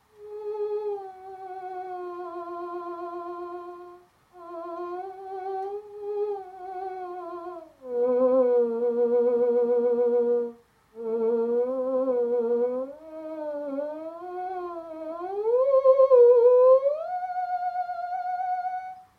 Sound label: theremin; tetherdcow; cowsound